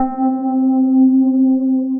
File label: Pianowobble; Synth Keys; Ableton; Factory Packs; Beat Tools